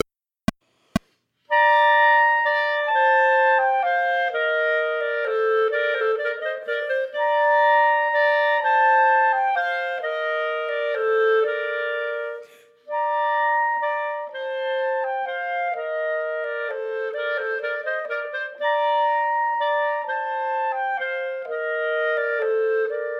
Minuet, Chamber Music for 3 Clarinets, minus 3rd part; Partita in F; Chamber Music for 3 Clarinets Vol I